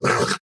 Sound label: Attack; Folk Hero